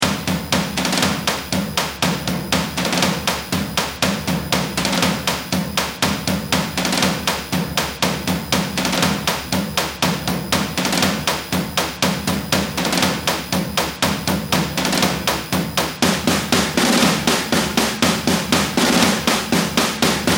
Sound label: bomb planted; music